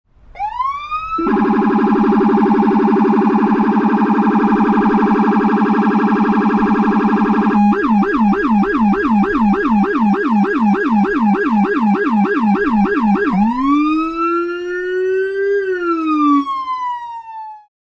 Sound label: rumbler